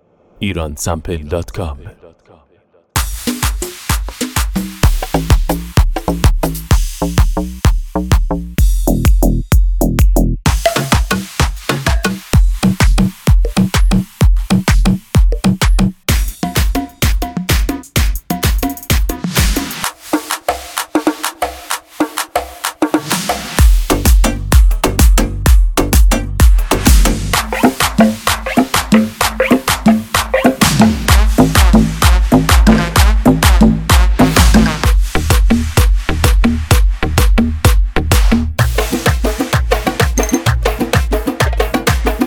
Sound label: tribal, 6/8